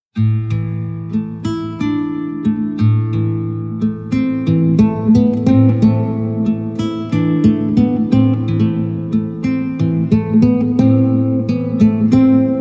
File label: Guitar